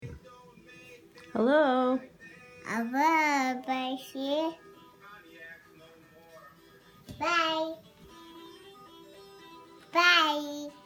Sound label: playing phone